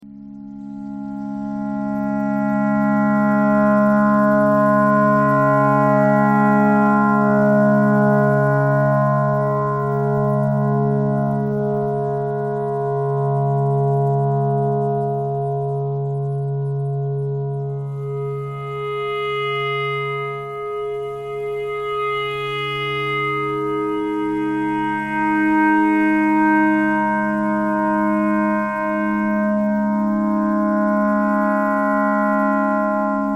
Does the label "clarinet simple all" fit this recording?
Yes